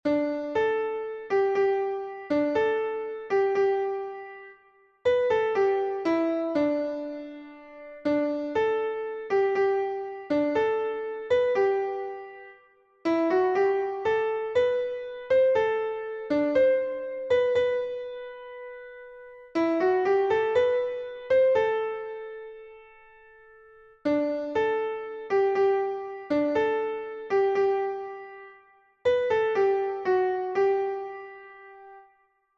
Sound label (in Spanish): soprano, himnos en piano; 49 TE ADORO PODEROSO DIOS; cantos del camino